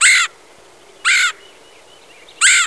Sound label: Red-headed Woodpecker; Woodpeckers; Bird Songs